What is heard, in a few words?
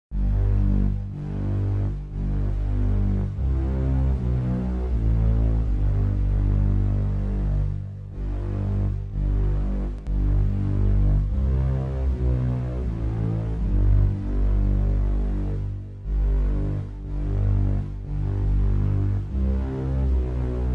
dirge